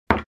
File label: Pool ball drop on pool table